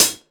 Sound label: short pedal hi hat d sharp, Royality free hat sound tuned to the D# note. Loudest frequency: 6841Hz; hat samples